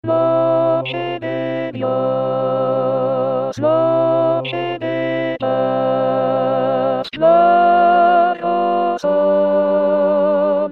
noche de paz alto

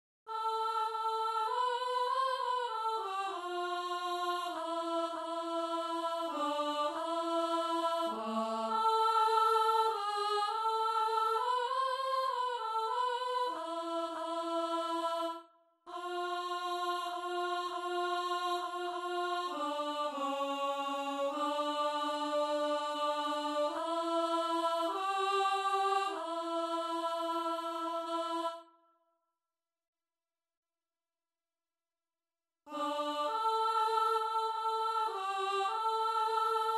una hora contralto

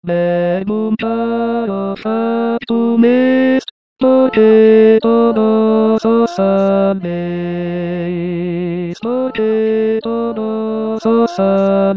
tenores; verbum caro

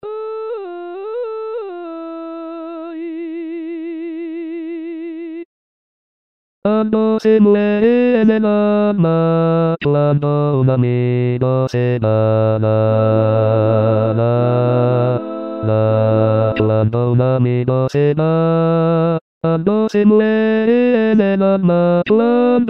sevillana bajo

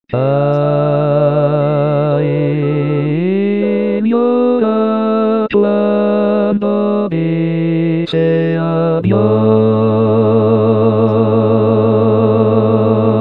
coda bajo

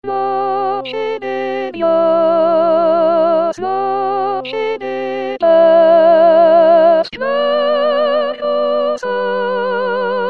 noche de paz soprano

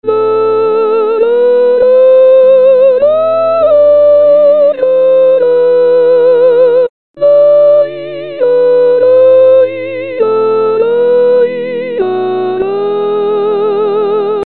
introducción soprano

